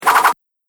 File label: High Speed Martial Arts Arm Attack Movement; Punch And Hit